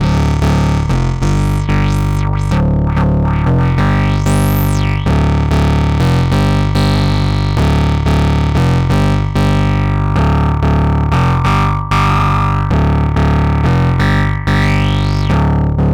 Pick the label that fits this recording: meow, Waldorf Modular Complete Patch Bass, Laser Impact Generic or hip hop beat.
Waldorf Modular Complete Patch Bass